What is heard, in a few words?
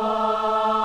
forte